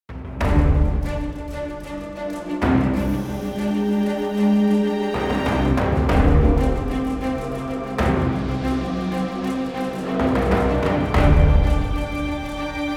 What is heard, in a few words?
suspense